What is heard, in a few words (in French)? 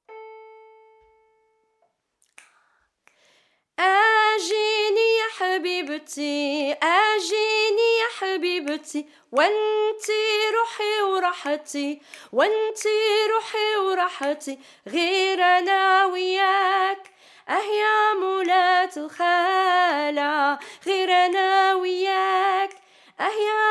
AL-KHALA CHANT 2 GROUPES 1 2 APPELS REPONSES